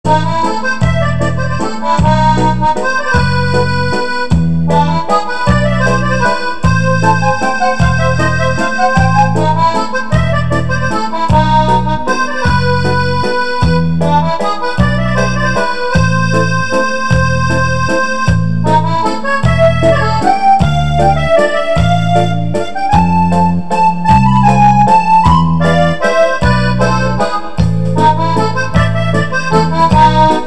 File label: Ringtone Mobile